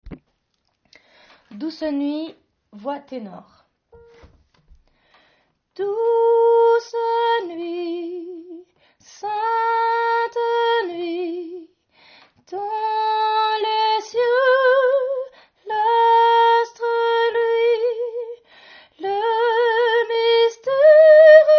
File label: Tenor; Douce Nuit